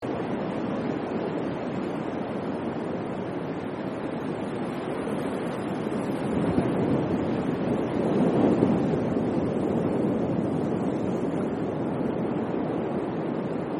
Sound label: Indian Ocean Waves